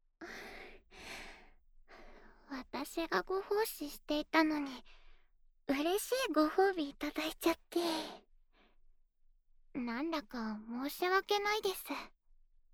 耳舐め後戯（左耳）

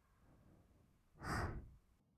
吐く音, ブレス; ASMR; 1200 kawaii voice!!

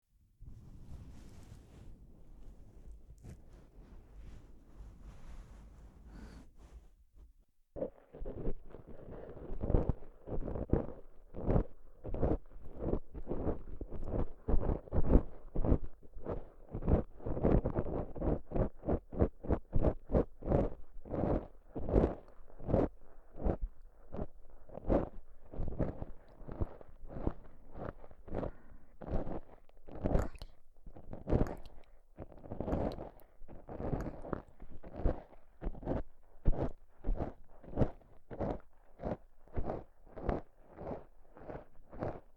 安眠用耳かきと指かき; おまけ安眠用耳かきと指かき

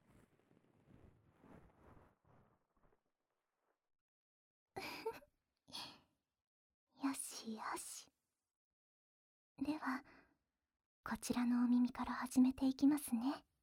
お耳掃除、いかがですか 耳かき部分のみ; ASMR

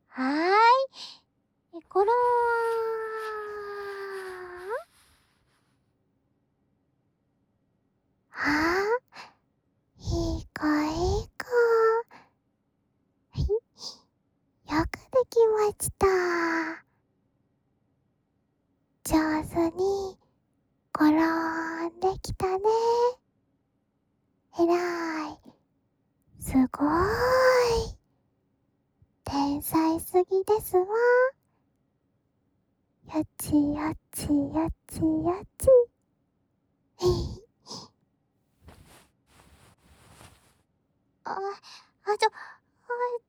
こども 耳かき （右）

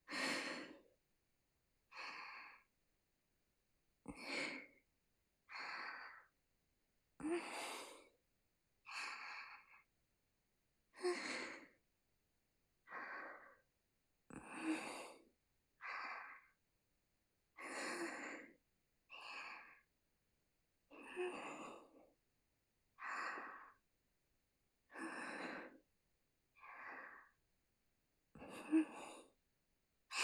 寝息（センター）鼻息

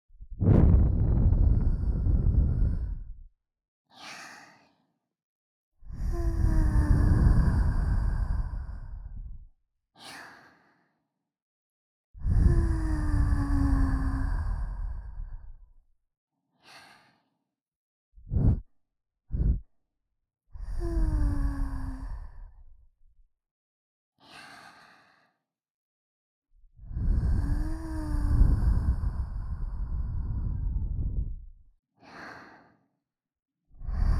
エンドレス耳かき; 逢心と詩織のエンドレス耳かき

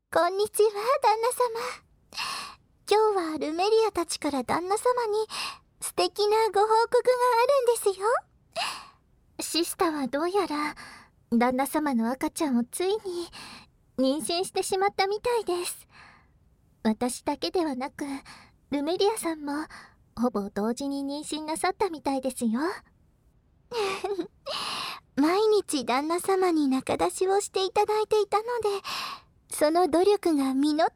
妊婦耳舐め, ASMR

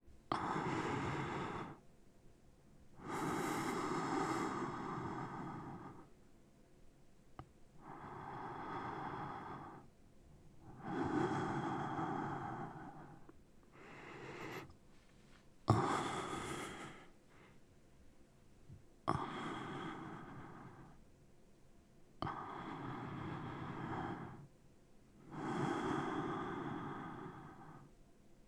寝息

